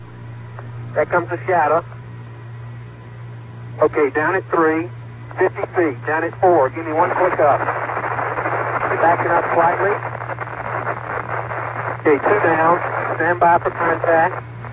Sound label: landing